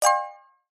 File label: multimedia alert notification glassy high pitched short positive